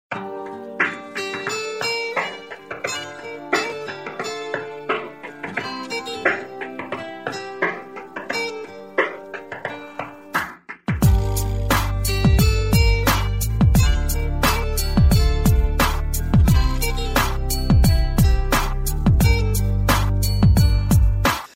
instrumental

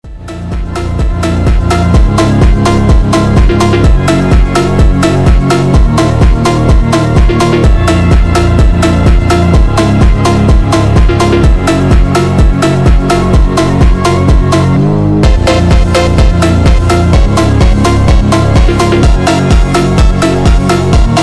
slowed reverb

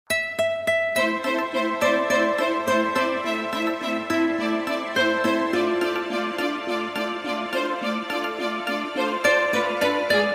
instrumental intro

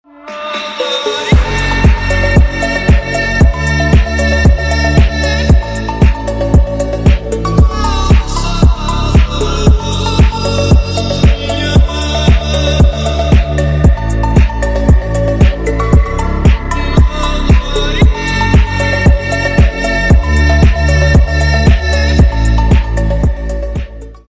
phonk remix

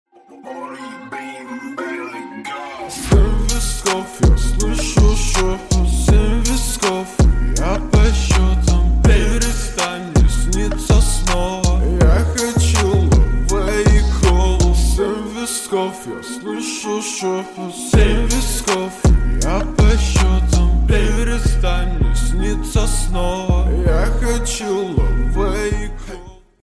slowed reverb

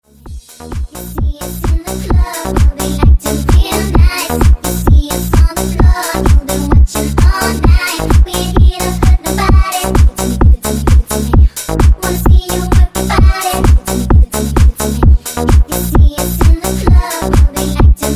slowed version

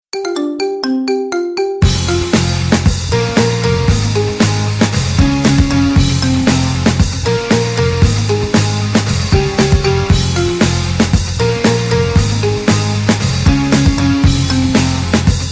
marimba remix

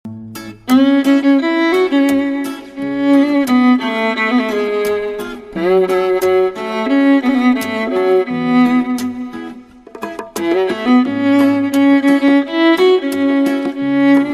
violin cover

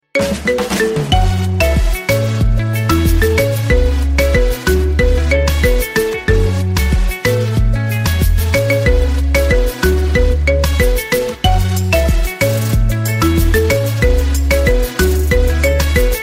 marimba remix

